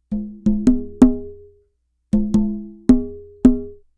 Tubano, African; Sonic Foundry (Sony Creative Software); Continental Drift Aftershocks

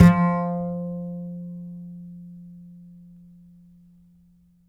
Upright Acoustic Bass; OPTIMAL; Larry Seyer

